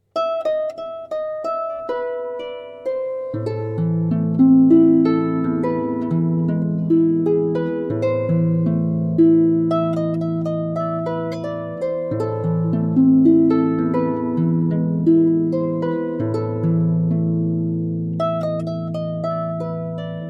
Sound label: Harp; Fur Elise